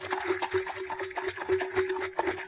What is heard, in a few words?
shake loop